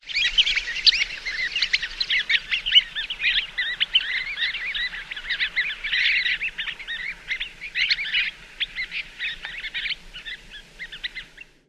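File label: flock calls, Sanderling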